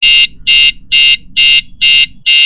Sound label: Beeper Sound